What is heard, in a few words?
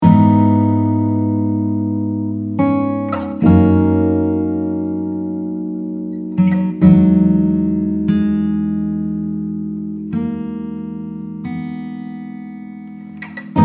Score Emotional; Der Lehrer